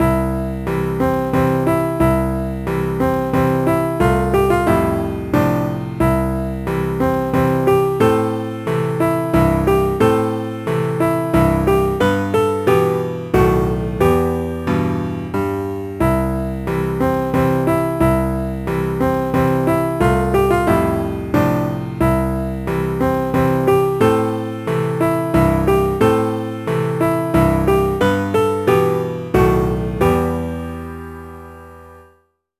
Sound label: BGM PIANO ALL